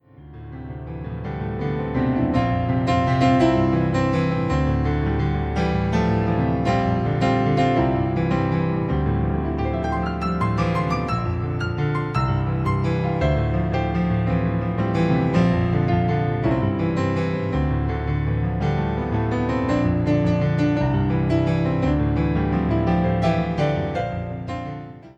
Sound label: Piano